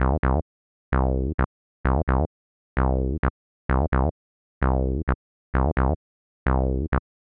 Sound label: 130 C bass, bass loops, trance; Trance Explosion Vol1